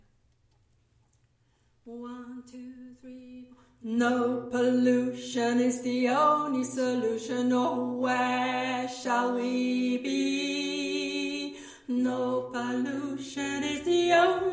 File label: ALTO